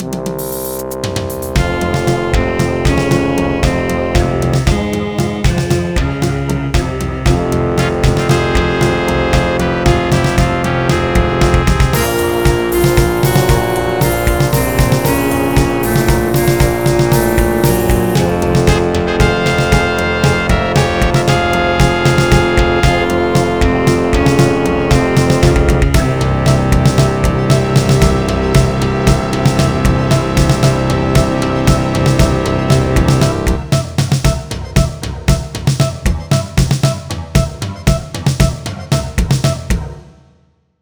Win; Warcraft: Orcs and Humans

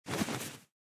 Butler Suit Rustle; lethal company; ambience; windows

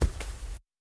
walk carpet

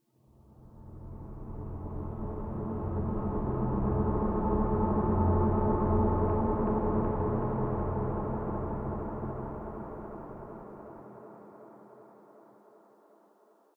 Diving Music Sweep Pad

